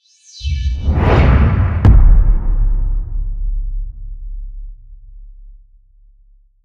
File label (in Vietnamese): tieng am thanh xuat hien tieu de trong phim